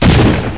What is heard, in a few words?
explode; blitzmax; shooter